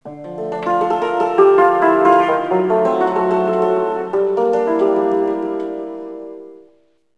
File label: cimbalom